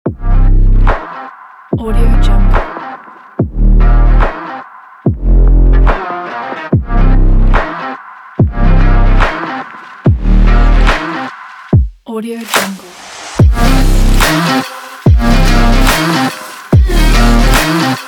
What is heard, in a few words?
sport fashions